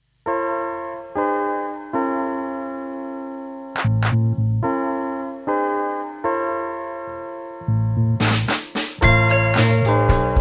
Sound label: groove